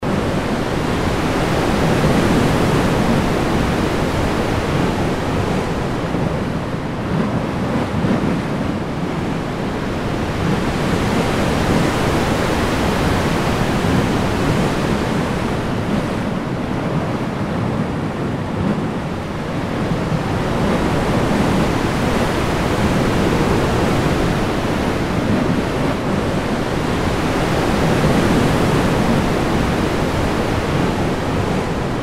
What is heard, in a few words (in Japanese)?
海の音 立体音